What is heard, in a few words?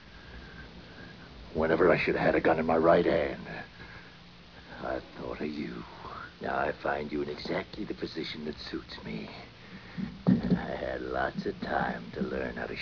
shoot